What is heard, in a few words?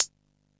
Hat, Drum & Bass; AMG - Prototype Drum & Bass; Hats & Rides